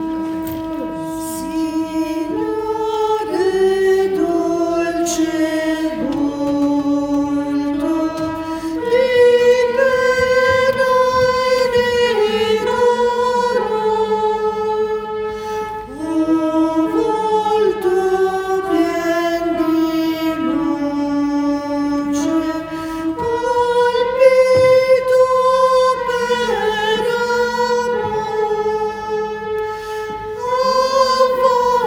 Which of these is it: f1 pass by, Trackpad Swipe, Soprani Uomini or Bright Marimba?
Soprani Uomini